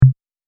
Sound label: collision